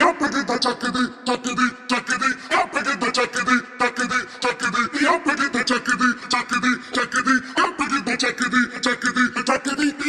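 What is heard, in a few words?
indian vocal loop, 95 bpm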